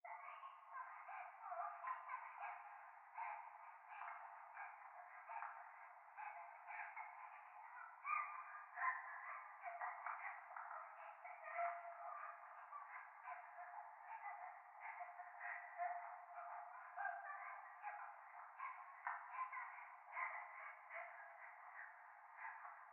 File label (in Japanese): 可愛い喘ぎ 電車; 教会